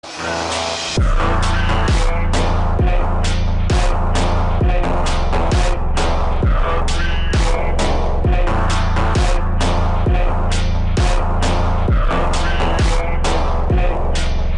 Slowed; ringtone

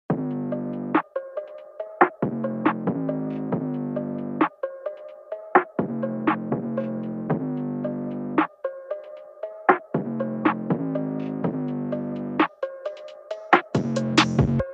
Phonk, Drift Phonk

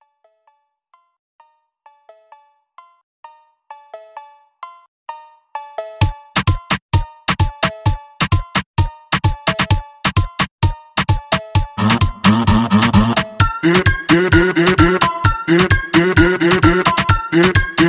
Funk Funk

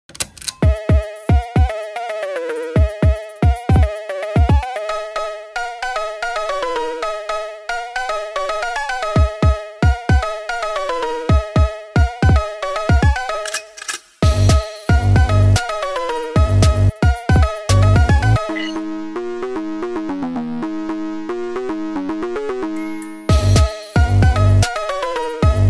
Brazilian Phonk Drift Phonk

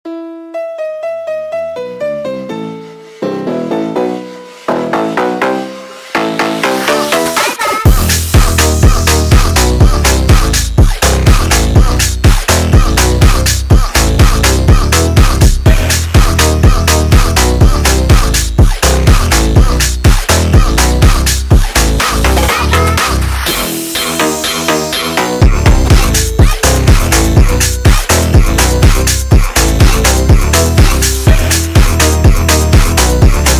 Classical Phonk

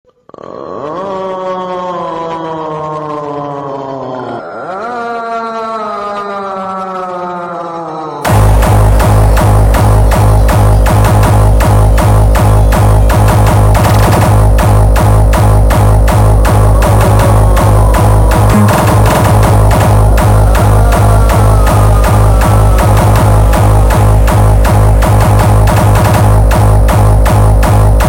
Hardstyle Killer Phonk Groove Montagem

